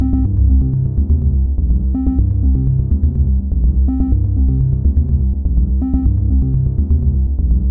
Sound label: 123 acid bass; SYNTH AND ELECTRONIC LOOPS